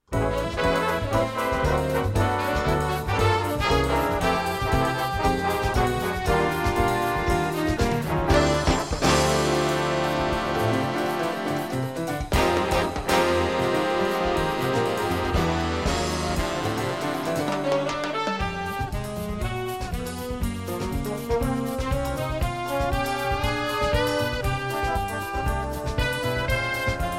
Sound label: Kicks and Sticks